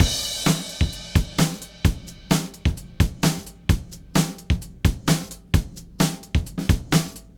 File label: Drums